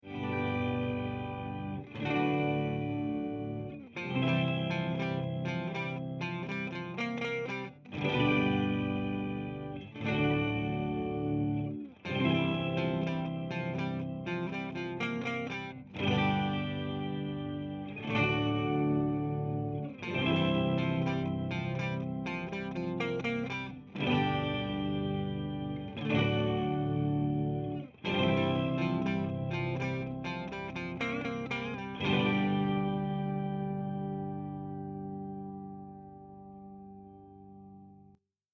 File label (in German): Sub N Up octaver orgel clean toneprint; tc electronic